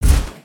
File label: walk; creatures organic